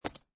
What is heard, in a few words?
Poker Chips Multiple Dropping On Felt; Poker chip drop on felt: Multiple poker chips being dropped on felted table; Lynne Music